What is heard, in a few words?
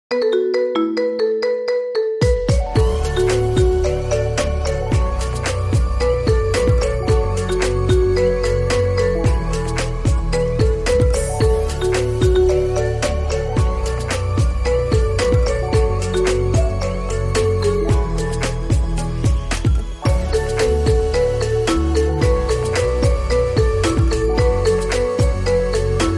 greedy marimba